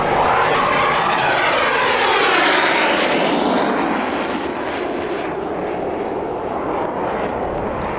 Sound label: air